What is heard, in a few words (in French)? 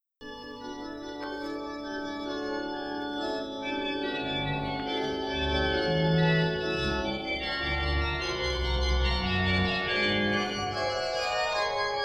Improvisation à l orgue